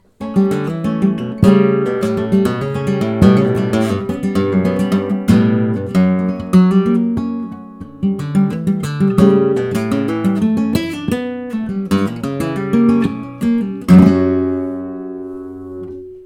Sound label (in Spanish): falsetas